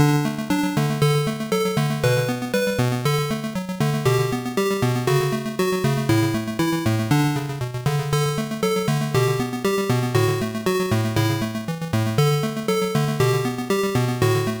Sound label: Taiko Performance Song; Futari wa Pretty Cure Pico